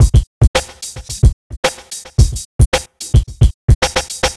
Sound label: NRG Break, Trance, Drums, Breaks; Sonic Foundry (Sony Creative Software); Trance NRG VOL-1